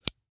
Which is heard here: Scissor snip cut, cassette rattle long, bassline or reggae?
Scissor snip cut